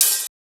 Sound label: Hat, Open Hats